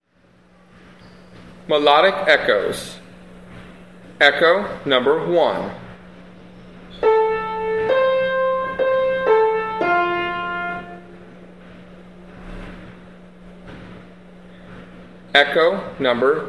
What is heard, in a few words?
melodic echoes